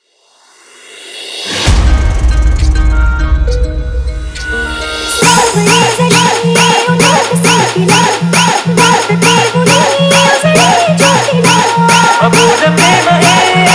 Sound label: Remix Marathi Song